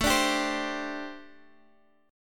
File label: G Suspended 4th A, Gsus4/A chord; strummed; JGuitar